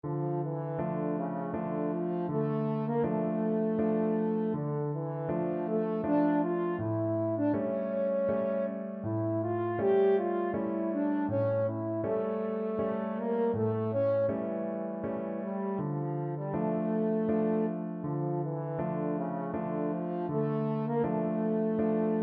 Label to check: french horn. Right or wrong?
Right